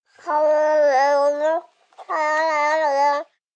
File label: Giggle Mumble, Baby, 5 months., cooing, happy; Laugh; human voice, child, kid, infant; Laughter; Sound Effects:Voice; Voice Box